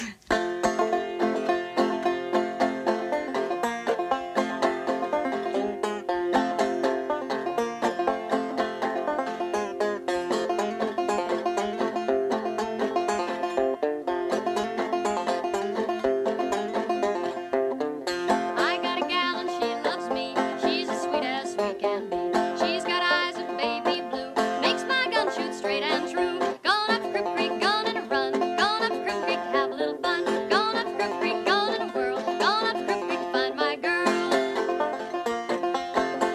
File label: Cripple Creek on the banjo